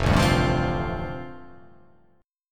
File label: F 6th Add 9th F down, chord